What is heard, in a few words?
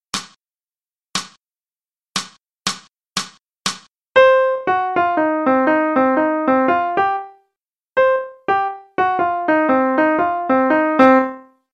Transcribing Rhythms; swinging